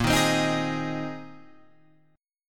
A sharp 7th Suspended 2nd Suspended 4th, Guitar, Open F; strummed; JGuitar